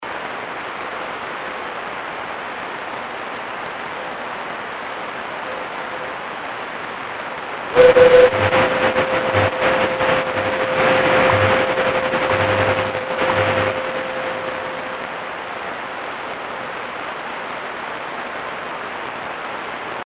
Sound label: WWV 25MHz am cw